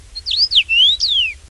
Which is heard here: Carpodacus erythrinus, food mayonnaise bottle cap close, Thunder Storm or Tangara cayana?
Carpodacus erythrinus